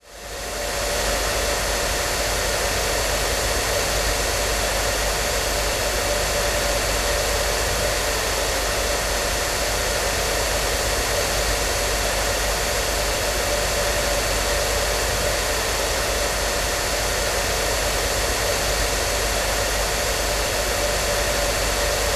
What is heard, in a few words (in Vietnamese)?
Tieng on trang 2 tieng may say toc